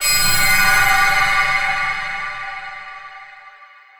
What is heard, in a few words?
Thunder Spell; Dark Magus Thunder Spell2